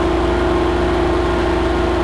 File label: inspection wagon idle; Max Payne; subway